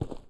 Footstep Stone Medium

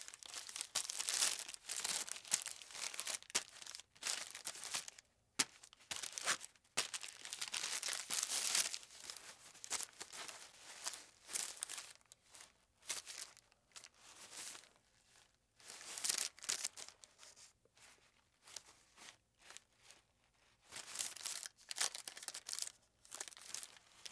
Sandwich wrapper; Fairlight Sound Lib

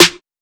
Snare C; Drum One Shots; Snares; Cymatics; Light Free Sample Pack; Bonus Samples

